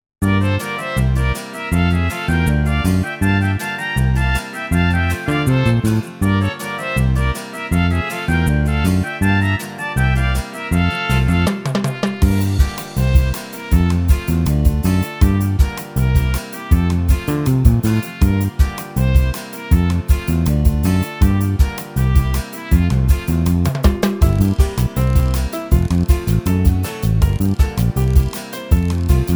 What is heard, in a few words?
Karaoke